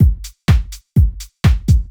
DEEPHOUSE BEATS 125

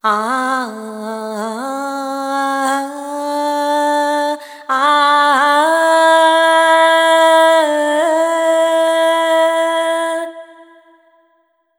ETHNO FEMALE, Vocals; ethno2

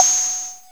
TAMBURIN 4; KillerTweaksII; DirectWave

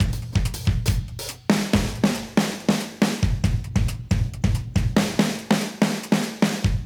Modern Breaks; Touch Loops; ClassicBreakSessions

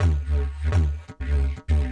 ethno, 125

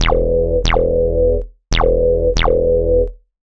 ELECTRO BASS 140 A

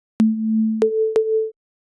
HOUSE EXTRA, 125

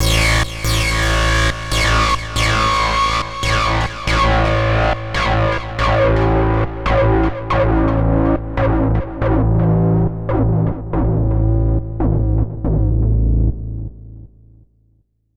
HARD BASS 140 A, Hard Trance, Bass

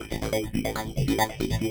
TECHNO SYNTH 140; Keys

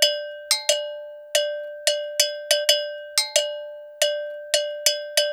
ETHNO EXTRA 90; ethno2

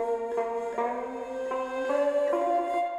ethno, 160, C

